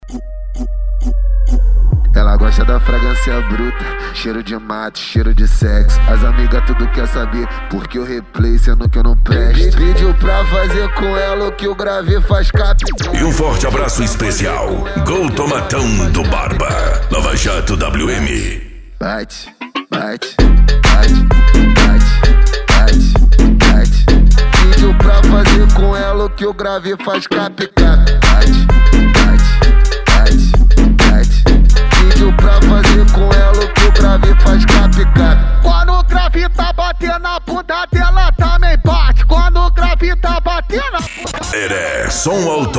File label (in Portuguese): som automotivo